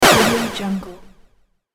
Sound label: echoes laser